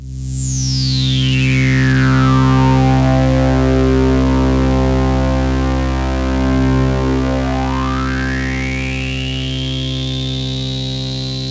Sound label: pad C2, 5U Modular; Polyend; Simulacrum Sounds; 1 Shots; Synths; Pads